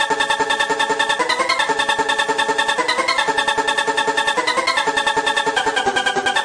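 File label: silly hardcore synthline, 149 bpm; SYNTH AND ELECTRONIC LOOPS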